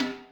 snare, Millenium MPS-400

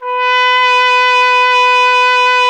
BRS TRUMPETS, Roland LA; Roland - LA Composer vol. 1

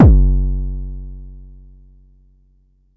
Bassdrum, Jomox Xbase09

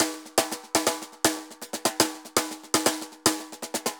TAMBOR, MERENGUE; Best Service ProSamples vol.14; AKAI

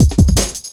Ezee Rolla Cut, Drum Loops

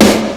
Fairlight CMI III Snare, Drum Machines

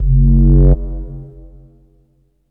sub; bass; EZRollers; Drum&Bass; Instrument Samples

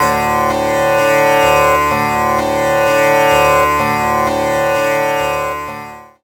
d2, Tabla + Sitar, MIRAGE; ProSamples vol.55 - Retro Sampler; Best Service

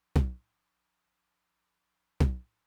Low Tom, Roland TR-909

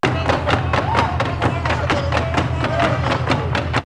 Dodoma 128, drumloops World Ethnic; X-Static Goldmine

